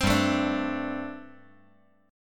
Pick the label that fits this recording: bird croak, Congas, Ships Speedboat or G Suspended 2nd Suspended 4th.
G Suspended 2nd Suspended 4th